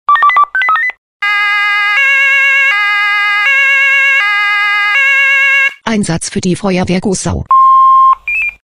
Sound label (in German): SMS Alarmierungstone; FW Gossau